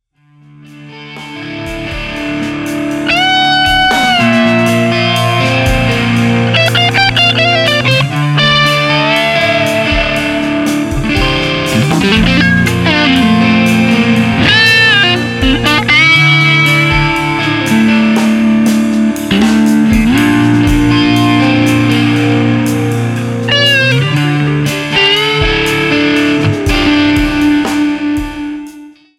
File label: Fender lead